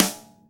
Piercing Acoustic Snare Sound G Key, snare drum tuned to the G note; snare drum samples